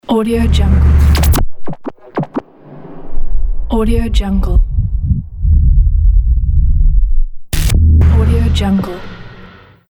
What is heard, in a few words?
glitch impact logo